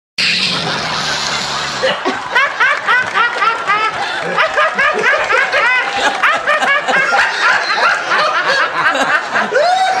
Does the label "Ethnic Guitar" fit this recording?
No